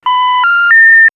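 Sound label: Melody, electronic fx; X-Static Goldmine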